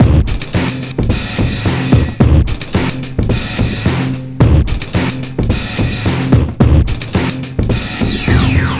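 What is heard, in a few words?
DRUM 109, HITBREAK 109, Breakbeat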